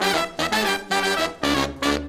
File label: Horns Section